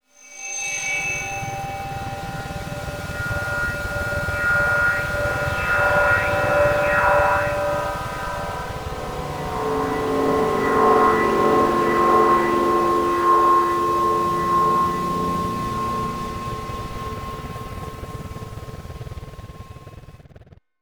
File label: Electronic Whoosh Reverse Feedback Digital